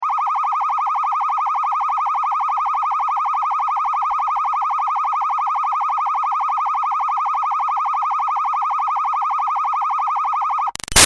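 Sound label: Siren Police Blipper Steady close up; Emergency Vehicles; BigRoomSound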